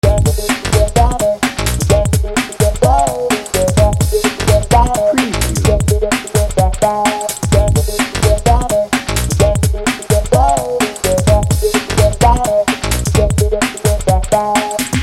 booty shaker loop

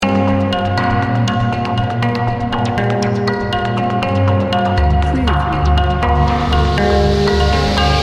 zen beach loop

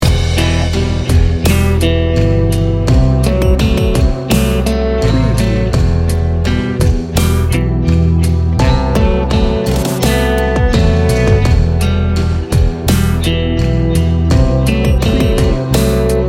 tragedy loop

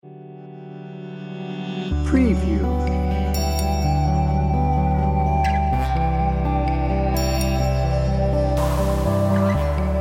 dream state

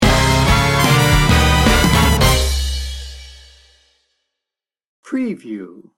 jitterbug jive sting bumper

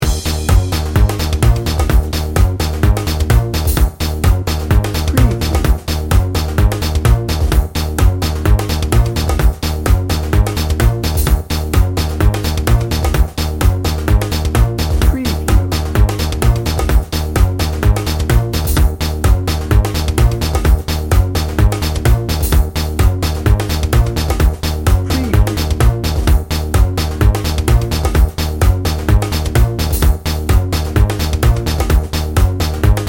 god rest ye merry gentlemen dnb

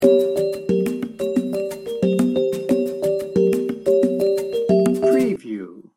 curious cat loop

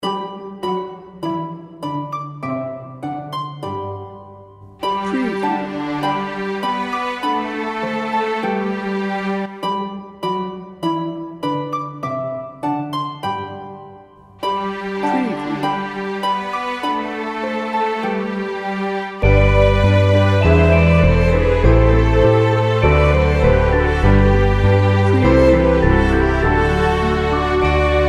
angels we have heard on high

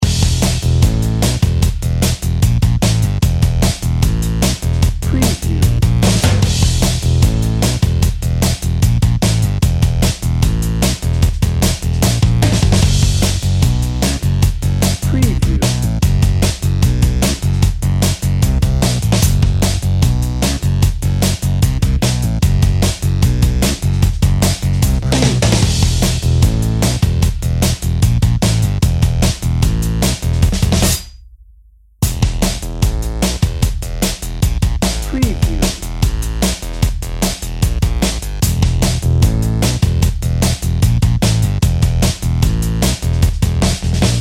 super hero dnb

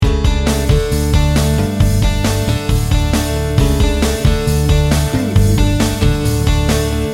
americana loop

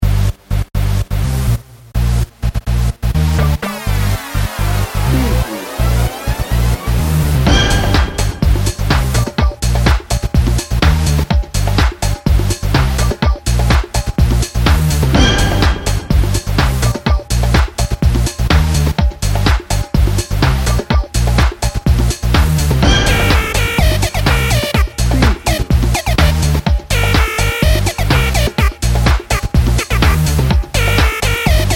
bollywood beat no vocal

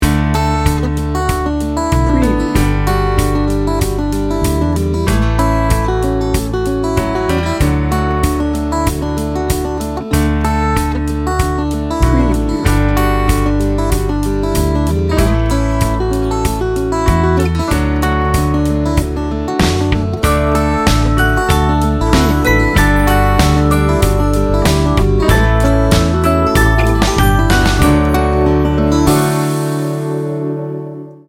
americana acoustic folk

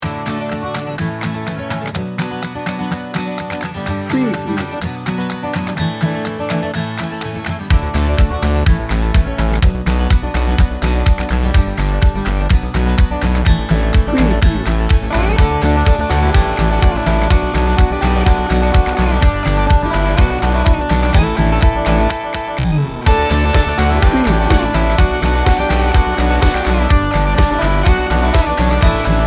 happy carefree indie pop

